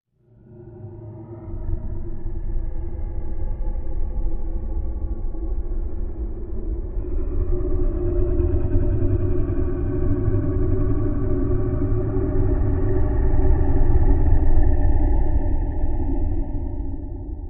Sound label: Growl Ambiance, horror